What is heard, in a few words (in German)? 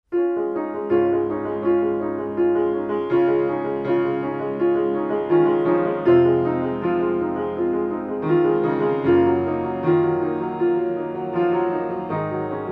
leichte Klaviermusik